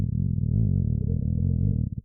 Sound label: stomach